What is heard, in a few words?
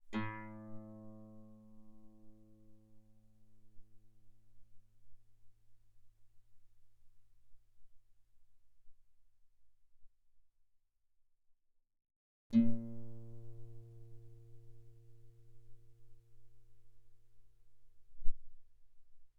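Guitar mezzo forte sul A A2 B2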